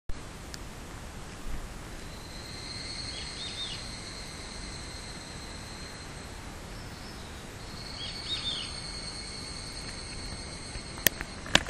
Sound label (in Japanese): semishigure